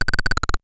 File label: dig; loderunner; APPLE2